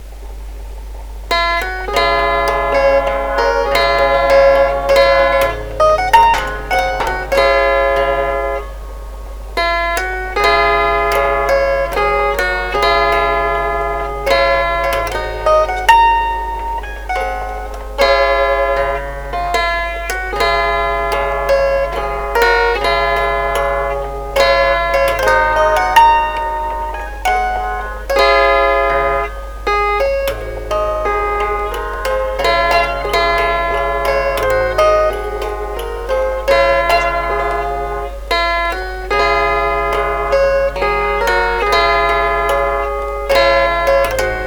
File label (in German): Chordephon